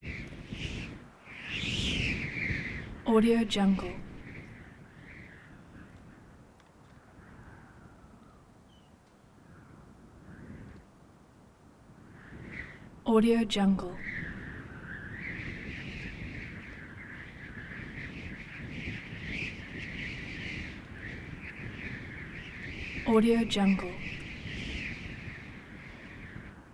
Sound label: desert winds fierce sporadic gusts whistley